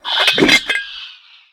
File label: Teapot Play